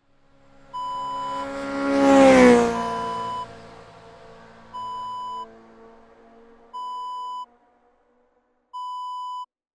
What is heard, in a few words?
motorcycle pass by